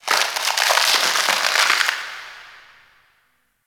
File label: Ice Close Ice Break Small; Warframe; Corpus Ice Planet